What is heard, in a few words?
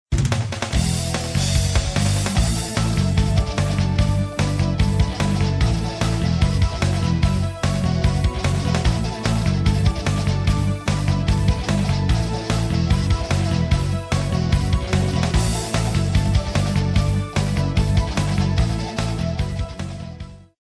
rock heavy